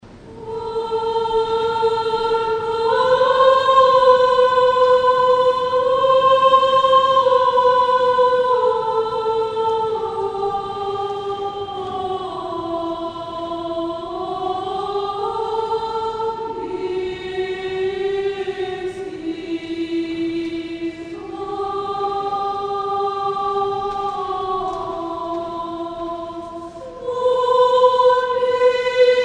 hor duhovnogo uchilischa velichanie demestvennyj raspev; na blagoveschenie

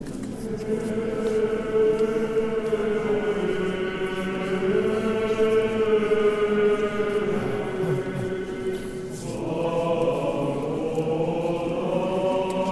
Хор Казанско Вятской епархии Днесь благодать Святаго Духа нас собра

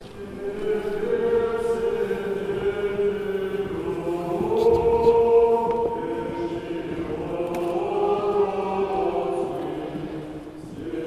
Рогожский хор 5 глас ирмос 6 песни

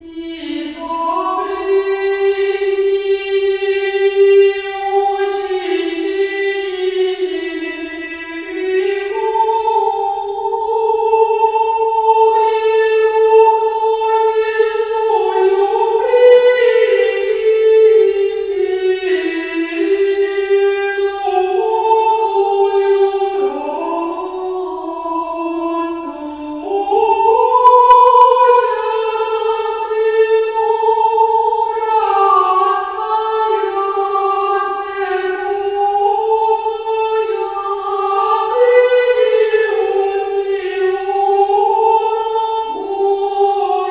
Пятидесятница ирмос 1 песни; Пятидесятница ирмос 1 песни 2016

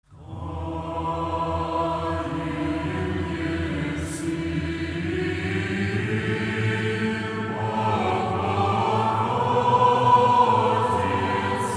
Фита. Ирмос Воздвижению Креста. Глас 8 Песнь 9; Таин еси

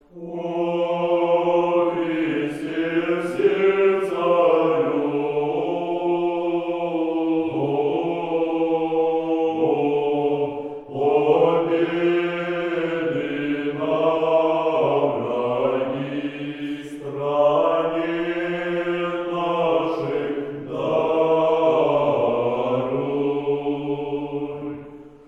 Сретение. 9 припев 9 песни; О Христе всех Царю; Казань

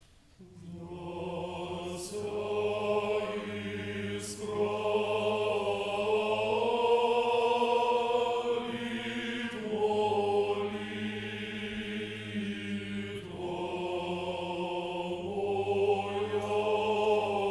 Хор певчих старообрядческих приходов Сибири; Да ся исправит; Распевы Святой Руси 2014; ММДМ

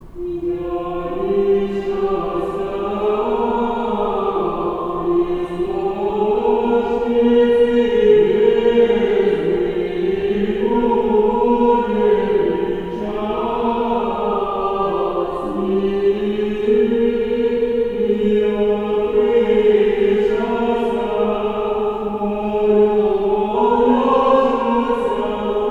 Цветная неделя 1 песнь 4 глас

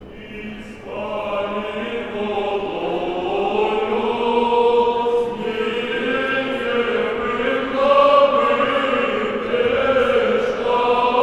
Богоявление Ирмос 2 творца 7 песни; Испали водою

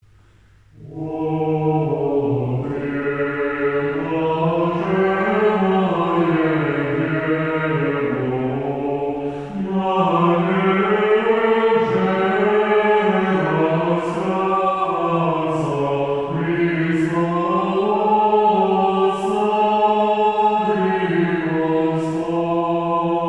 ирмосы Воздвижение креста песнь 5

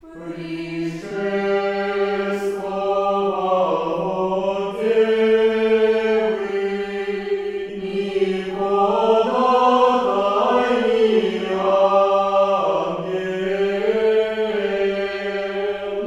2 глас ирмос 4 песни; Пришествовав

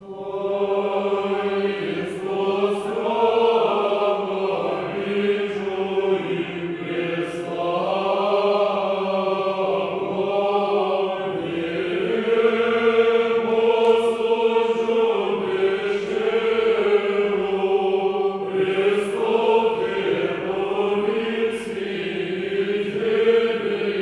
Ирмос 9 песни первого творца 1 глас Рогожский хор

